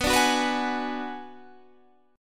Gsharp Minor 7th Flat 5th

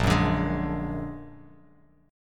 Bb Augmented 7th Bb down Bass Standard; Bb+7; strummed; JGuitar

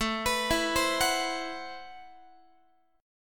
A Minor 6th Add 9th A Guitar; x,17,14,14,16,17; arpeggiated; Am6add9

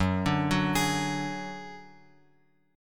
F sharp Suspended 2nd Sharp 5th; F#sus2#5 Chord {2 5 4 X X 4} arpeggiated

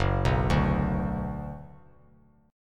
Gb Suspended 4th Sharp 5th; arpeggiated; JGuitar

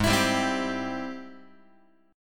Ab Augmented Major 7th, Guitar; strummed; x,4,7,5,4,6; JGuitar

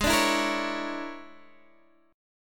A sharp 7th Sharp 9th Flat 5th G sharp

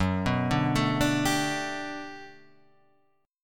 G Major 9th, F sharp

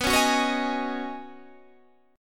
Db 9th Suspended 4th B

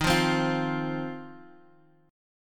C Minor Double Flat 5th D sharp

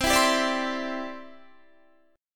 C Major Add 9th, Guitar, Standard; strummed; JGuitar

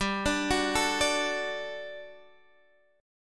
G Major 7th Suspended 2nd G down Guitar Standard; arpeggiated

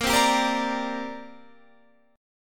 C 7th Suspended 2nd A sharp

